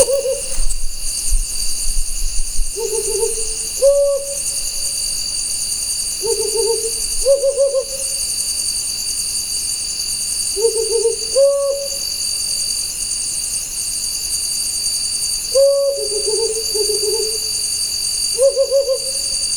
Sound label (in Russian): mangovaya neyasyt strix ocellata, zvuki nochnyh ptits